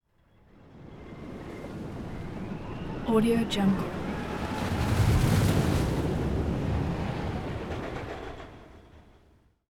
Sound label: surf roller coaster